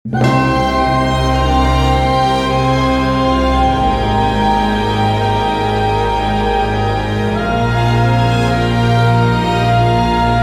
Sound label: Shine Field; Gran Saga